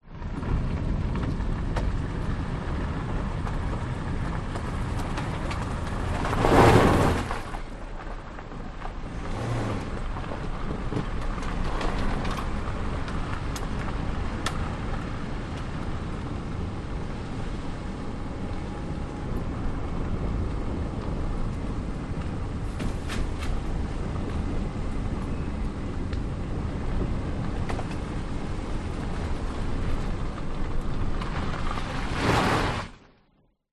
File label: vehicles car suv driving gravel track internal windows open skid continue skid; stop